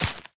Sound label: place, cactus flower; minecraft; block